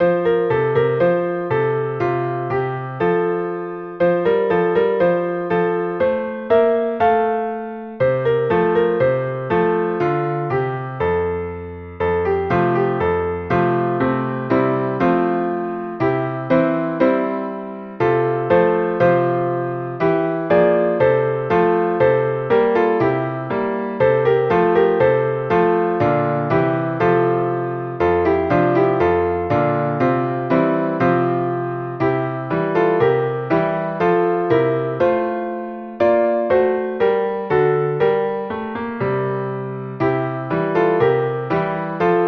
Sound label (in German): Klavier